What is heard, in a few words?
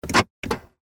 Car Door Unlock